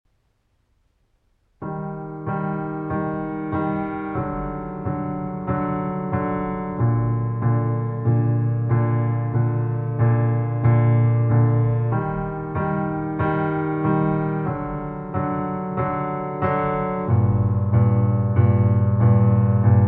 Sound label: Solo Piano